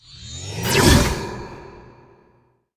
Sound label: boss umbra shoot ray